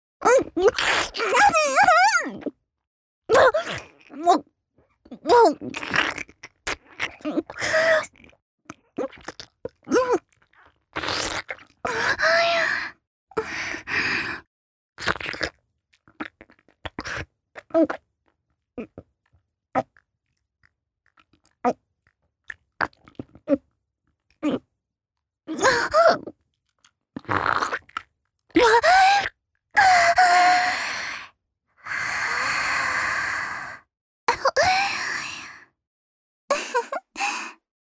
口内射精→飲精, チュパ音

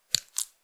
ぱちゅ音単発, ゆっくりめ

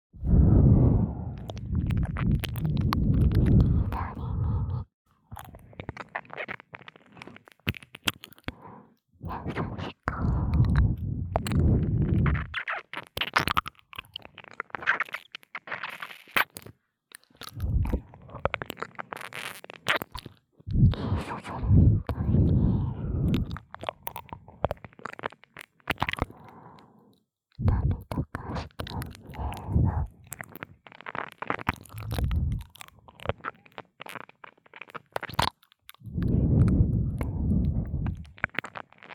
左耳キス舐め, ASMR

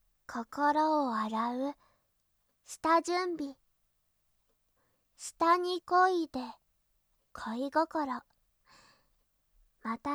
耳洗導入歌 歌う気持ちで、みみせんを

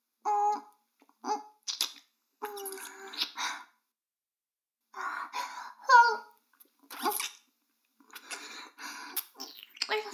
耳舐め音声(LR逆ver); 逆時間停止されて

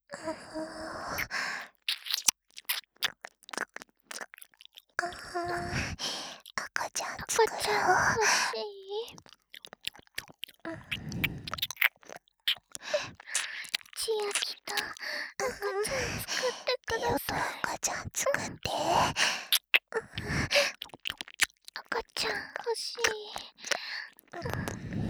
耳舐め 喘ぎ, バイノーラル; 赤ちゃん作ろ♪; ハーレムオナサポ 4人のメイドがご主人様のオナニーをお手伝いするバイノーラル～文、千曜、名月、莉桜～

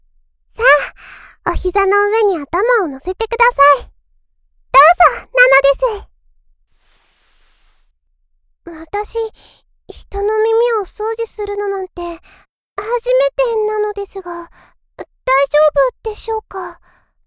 お耳、綺麗にするのです(右), ASMR

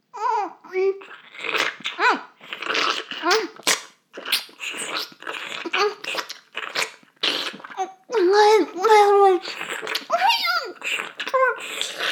フェラ音声; 少佐殿; 牧場戦腺

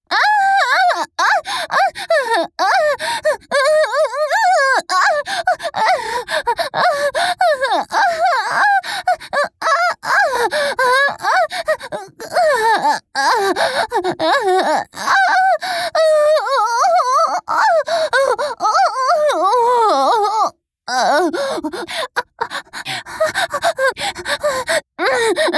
相手に激しく突かれる; 実用ボイス抜粋集

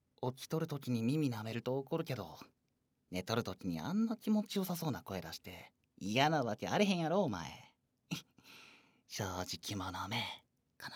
寝てる間にご褒美あげよっ 耳舐め

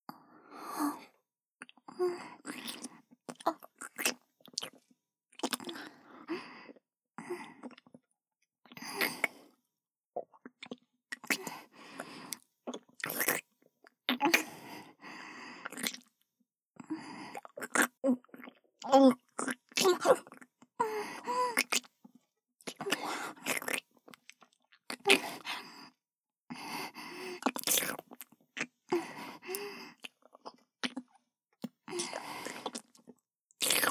ディープキス奉仕

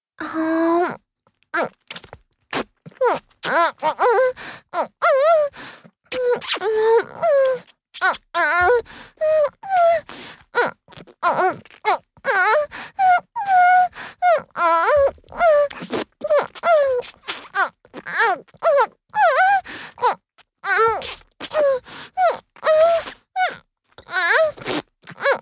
フェラチオ(強):頭を前後に振って陰茎をしゃぶる

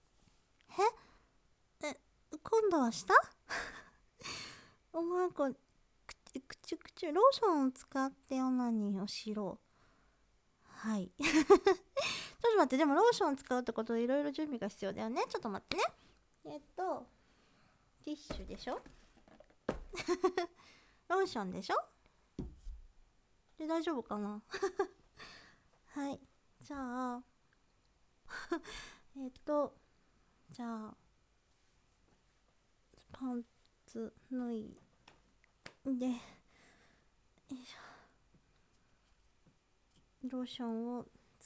くちゅくちゅオナニー（実演）_モノラル

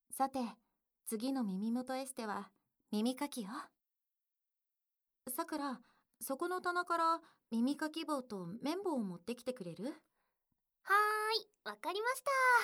左右いっしょに囁き耳かきプラス

